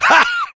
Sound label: Heavy laugh short; Rire court 2; Réponses du Heavy